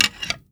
Arrow Nock; SteamVR; InteractionSystem

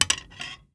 Arrow Nock; SteamVR; InteractionSystem; Longbow

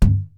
Balloon Bounce; SteamVR; InteractionSystem

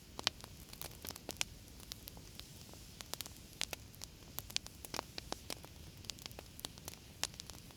Torch Fire Loop; SteamVR; InteractionSystem

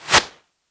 Arrow Air; SteamVR; InteractionSystem; Longbow

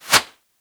Arrow Air, Longbow; SteamVR; InteractionSystem

